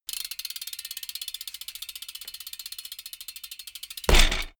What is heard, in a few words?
bmx bike ramp fly land